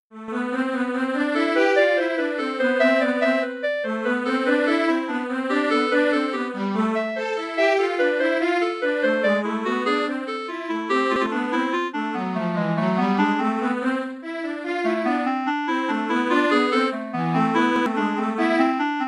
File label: Wedding Gung Ho Clarinet Cello Midi; wedding suite